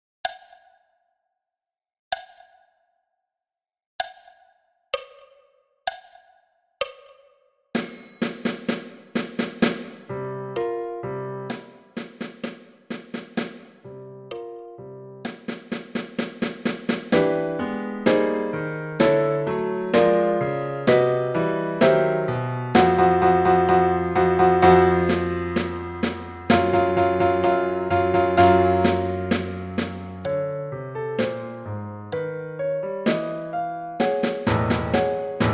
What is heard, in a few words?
PRELUDE ET ALLEGRO a 64 bpm, percu